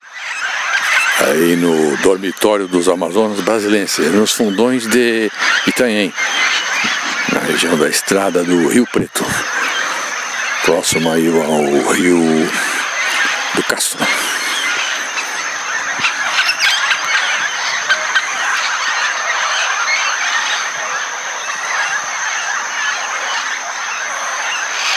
Amazona brasiliensis; R Castro; Itanhaem SP; Silveira

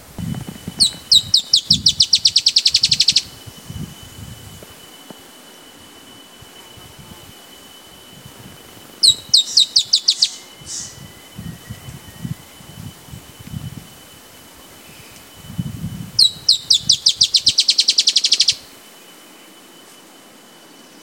Drymophila malura; Reg Ferradura; Canela

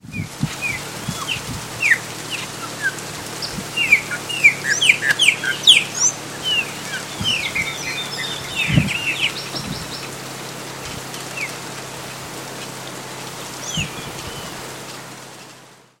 Gnorimopsar chopi; I Comandatuba

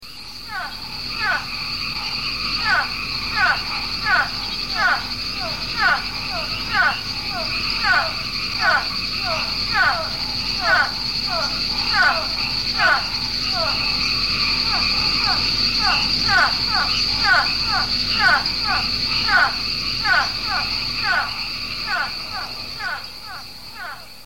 Trachycephalus mesophaeus; Jureia SS SP; A Silveira

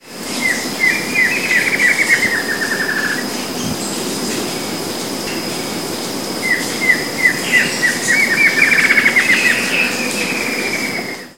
Dysithamnus mentalis; PE Cantareira; SP; minisony

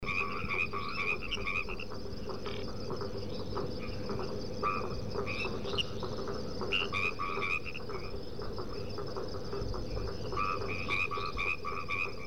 Hypsiboas albomarginatus; Picinguaba; SP

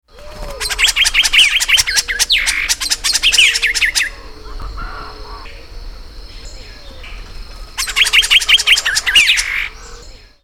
Saltator caerulescens; Barao de Melg; MT Silveira

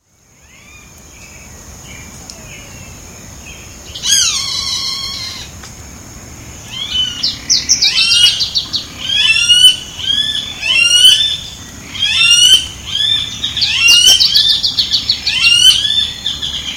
Pardirallus nigricans; Jd Botanico POA RS

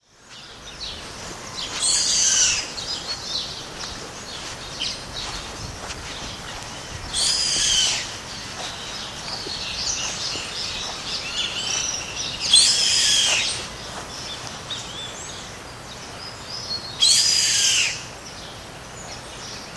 Milvago chimachima; I Comandatuba BA; Silveira

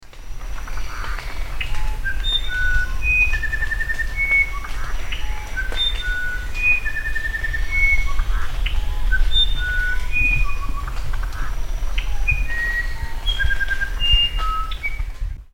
Cyphohinus arada Musician Wren, Cristalino; A Silveira

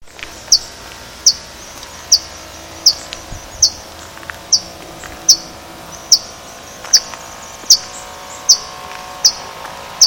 Zonotrichia capensis calls; Pt Ballena; UY

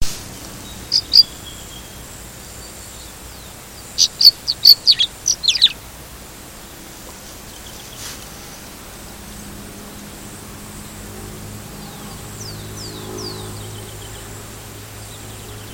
Phaeprogne tapera; Lag del Diario; UY; Silveira

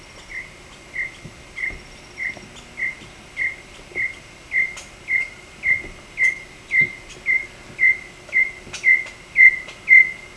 Crypturellus obsoletus; Picinguaba; SP; Brazil

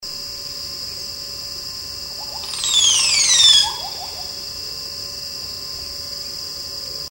Psarocolius decumanus; EE Angatuba; SP; A Silveira

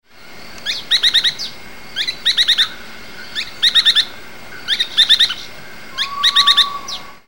Synallaxis spixi; PN Itatiaia RJ; Brazil; A Silveira

